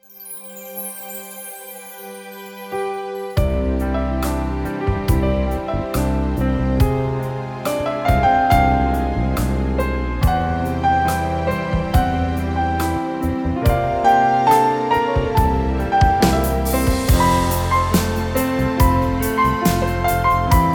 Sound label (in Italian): notturno piano e basi